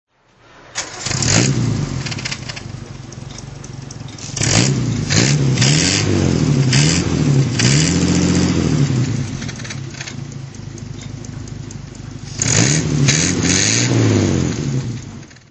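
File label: jaguar ss1 throttle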